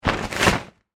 Monster Wings Flap, Horror, Monster, Wings, Flap, Cape, Movement; BlastWave